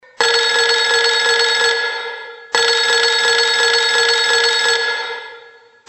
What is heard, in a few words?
bell